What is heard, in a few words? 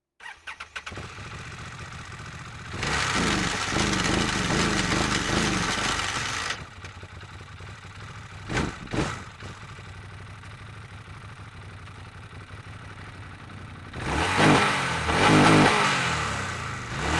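Textron Wildcat X onboard idle various engine right; dpa4061; Sonniss GDC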